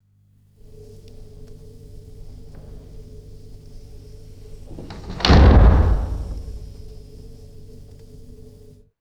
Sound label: heavy interior door shut with reverb